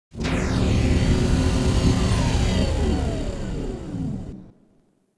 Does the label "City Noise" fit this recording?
Yes